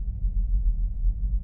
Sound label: sub drone; lower loop